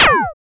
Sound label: Laser